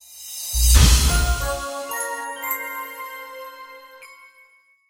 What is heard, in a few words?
4 note Jingle